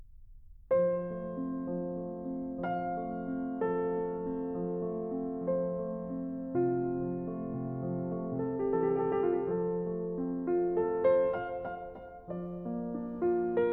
Sonatina no. 1 in C major, op. 36 - II. Andante, Piano